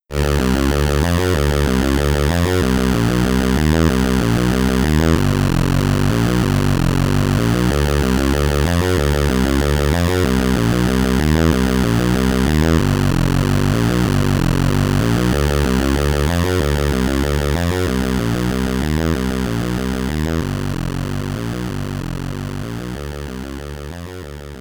SID Stereo, commodore 64

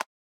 Catch; Boomerang Fu

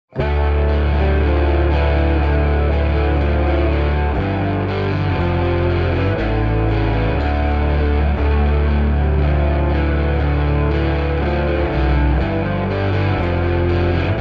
Carnival Halloween Rock; Ultimate Zombie Defense; Windows

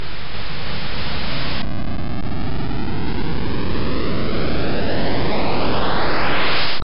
Warpout; tempest 4000

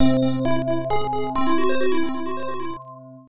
Jingle (Doubt); rpg tsukuru dante98 ii pc-98 1996

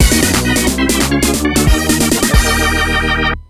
Jingle ~ Change Character; mario super sluggers; wii

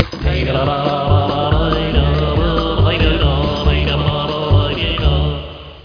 Western Stinger; freddi fish 4 the case of the hogfish rustlers of briny gulch

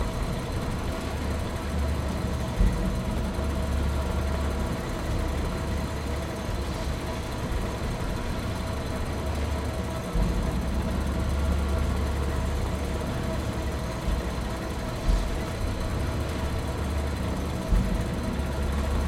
Environment conveyor long

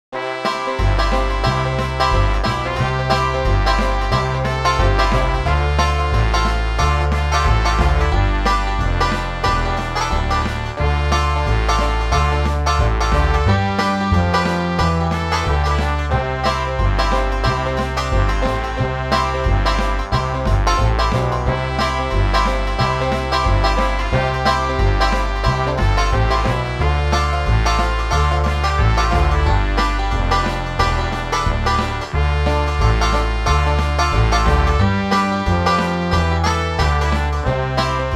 The Jazz Band

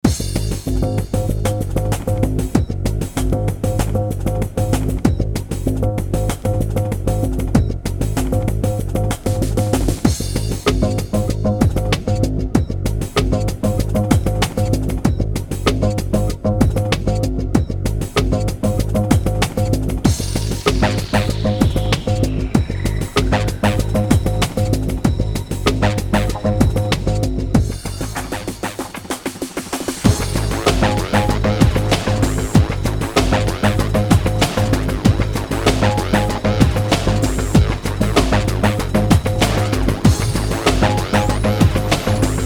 Battle; Space Force Rogue Universe

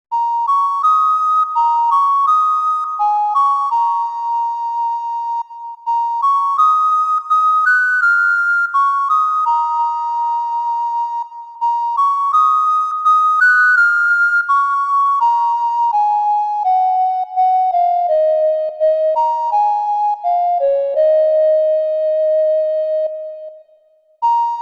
Ocarina; Aitakute... Your Smiles in My Heart original game soundtrack vol.2

